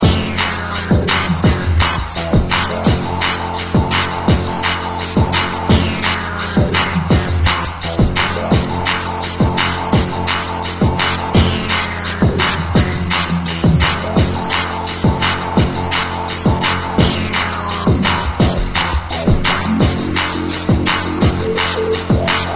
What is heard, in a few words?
dark star bassline